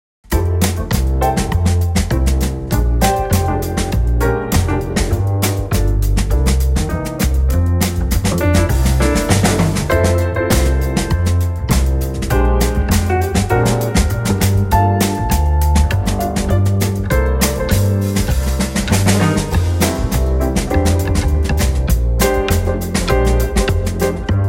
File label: Medium Fast Samba, flutist; Practice Song No 21